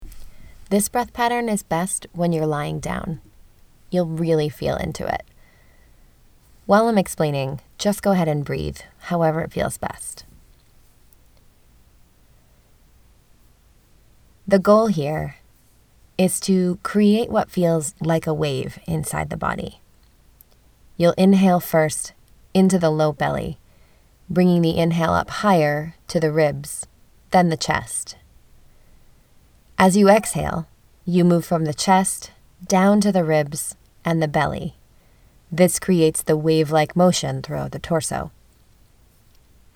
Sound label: wave breath